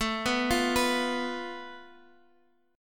A Suspended 2nd Sharp 5th A Guitar Standard, chord; Asus2#5; arpeggiated; x,12,9,10,12,x; JGuitar